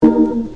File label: shortsoft, Organs